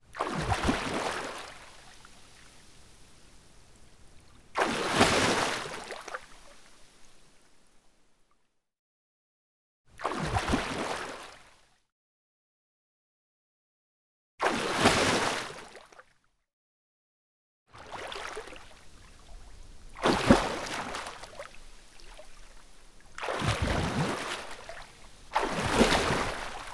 Tail Impact Of A Sea Animal

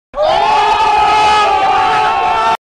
Oooh Sound

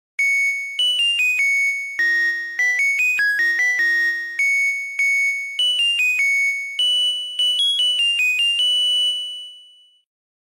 washing machine ring

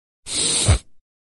Sniffing

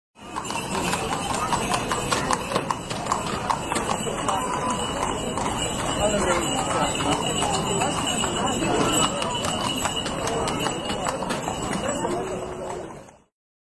horse and carriage sfx

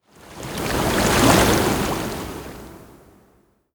Water Whoosh

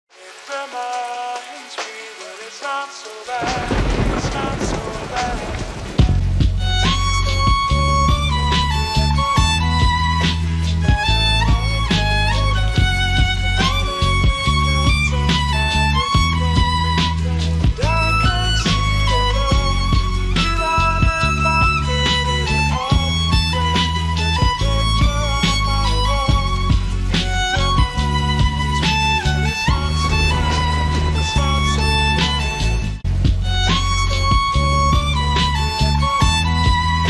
Violin Version

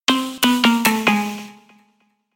losing

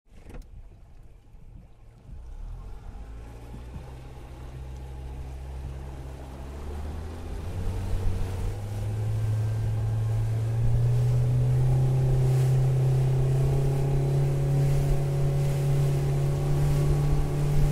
Speed Boat Ride Ambience; motor boat

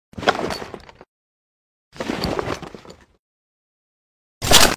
Ammo Pick Up Sound

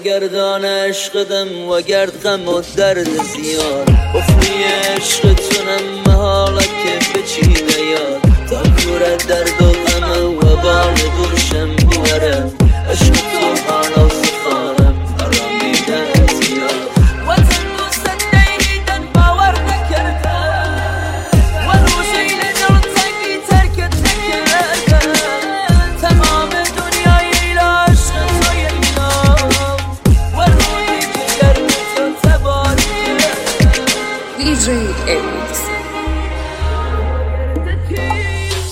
Sad Remix

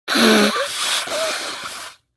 Sniffing

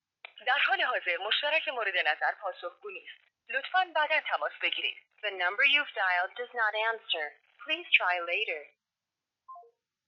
dial sound, phone

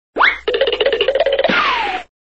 sound effect Running away

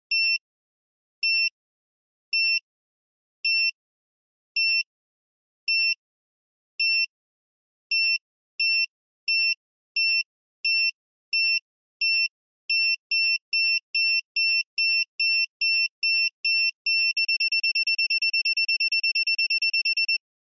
bomb timer; guns

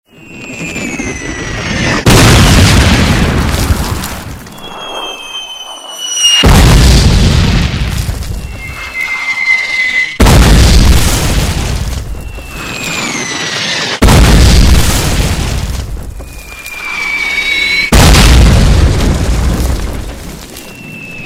Mortar fire incoming; war

